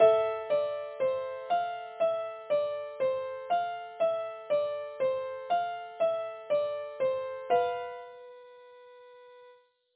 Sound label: ligatura piano